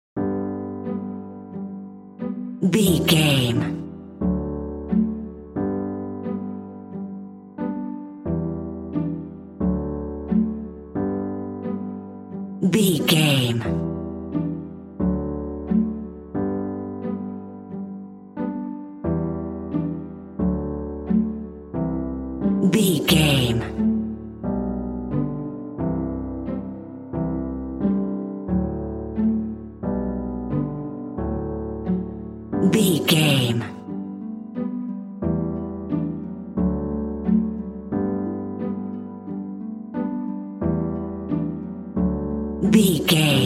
soft piano and plucked strings

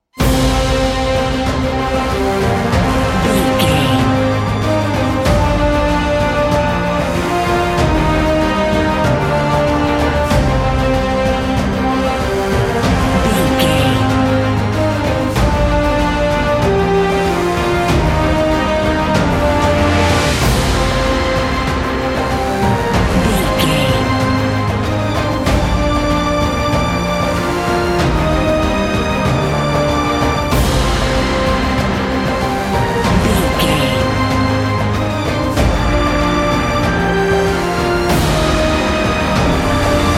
epic cinematic

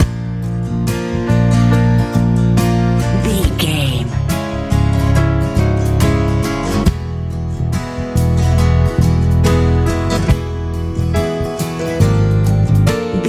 powerful warm swirl big dreams lite teen pop

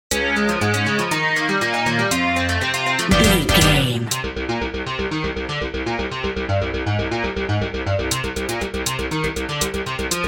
classic adventure video game no lead

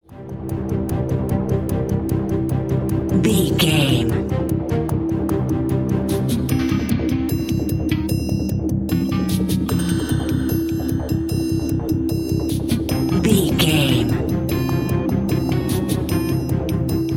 suspenseful ticking

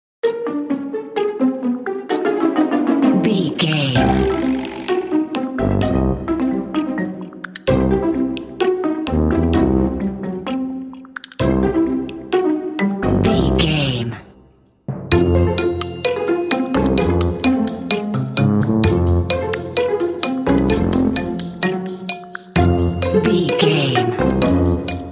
silly fun

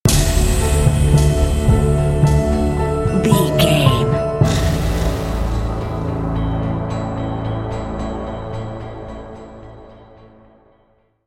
dark and sinister sting

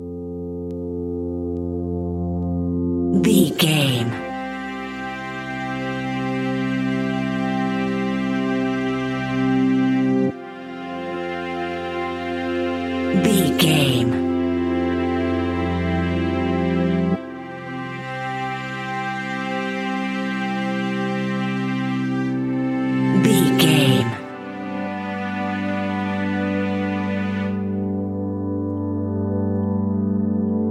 take care each other strings

